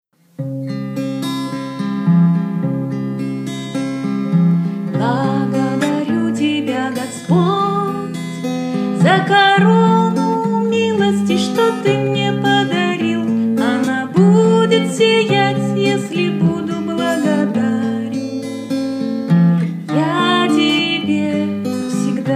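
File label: Song, Russian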